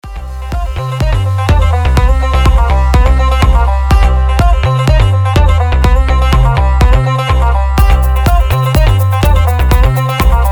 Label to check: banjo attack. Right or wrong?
Right